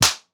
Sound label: Whipslap